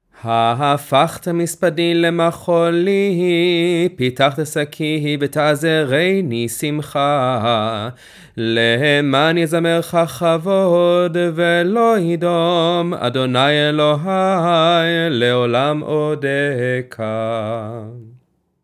Psalm 30 Closing; shabbat psukei dzimrah

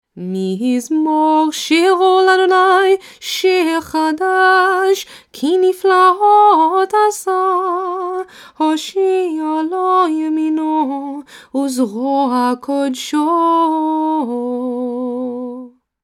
Psalm 98 Opening Nusach; kabbalat shabbat

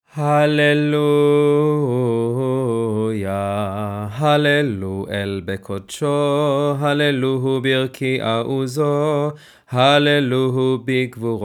Psalm 150 Unknown Melody; shabbat psukei dzimrah

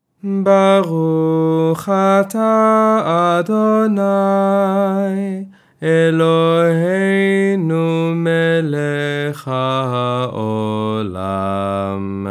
Shehecheyanu blessing fun American melody; high holydays childrens family services

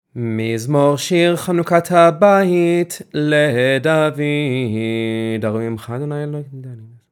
Psalm 30 Opening; shabbat psukei dzimrah

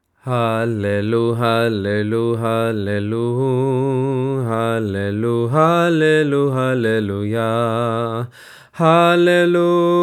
Psalm 150 Sufi Melody; shabbat psukei dzimrah

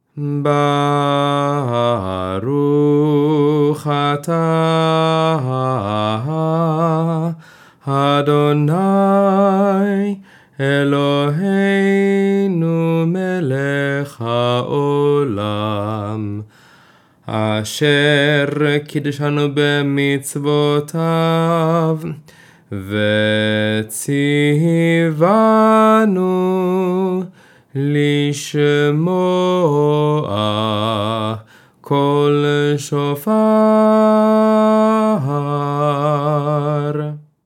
Shofar blessing; high holydays childrens family services

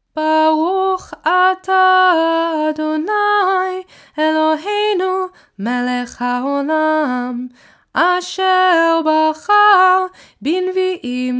Haftarah blessing BEFORE Eicha trope; haftarot

